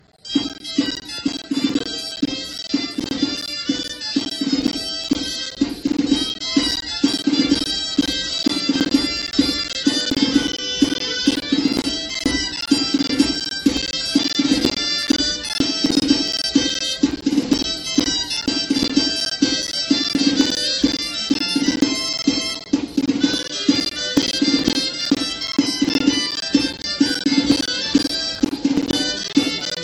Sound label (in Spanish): Mazurka; Cabanillas; Mazurka de Arcos